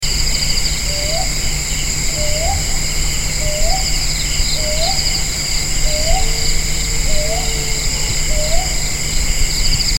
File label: brown hawk owl Ninox scutulata, Thekkady Kerala